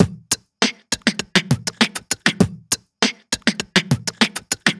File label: Beat Box Drum Loop; aawaz